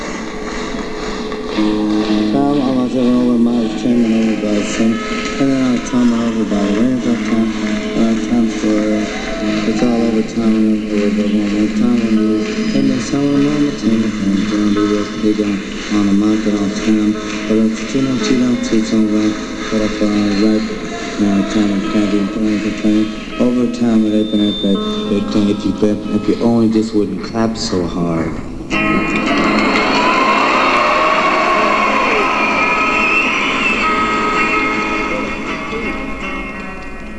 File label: slow clap